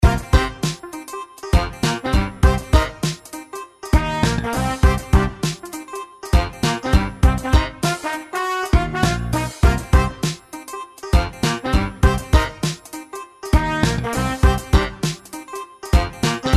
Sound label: bgm